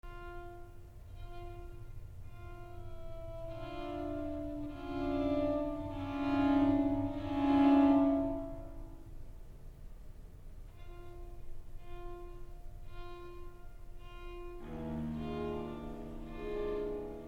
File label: String Quartet